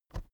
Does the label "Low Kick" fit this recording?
No